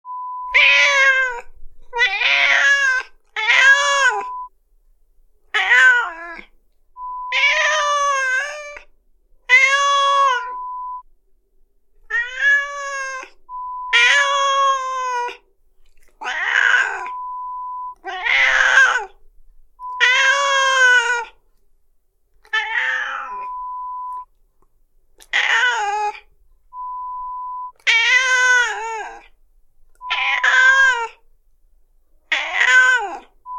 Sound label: Cat sound effect